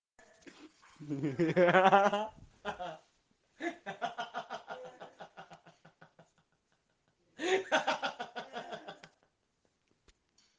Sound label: laugh